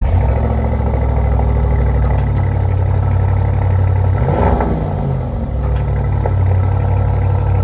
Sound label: SQ8 exhaust